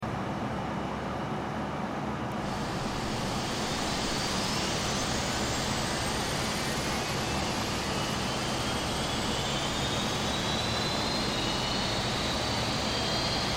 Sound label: airbus startup